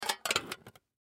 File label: Candy Tin Close; Clicks; Foley; Objects; Blastwave